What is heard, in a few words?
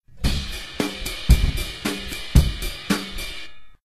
drumkit; music; percussion; stamps